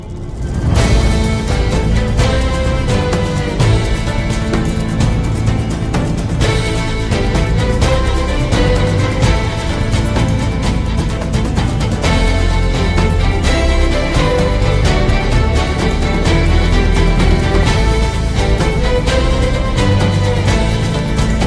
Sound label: ringtone; assassins creed iv black flag